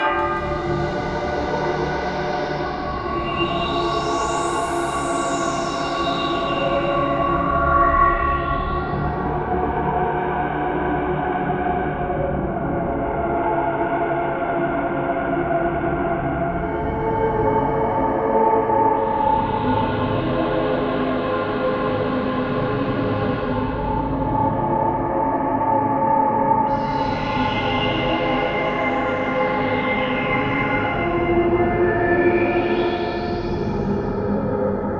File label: Horror Game Intro, horror surreal